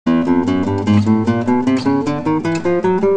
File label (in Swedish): Klassgitarr